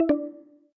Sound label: error